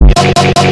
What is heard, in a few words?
glitch